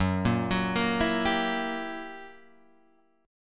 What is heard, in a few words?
F sharp 7th Flat 9th Guitar Open G, chord; 4,3,4,3,5,5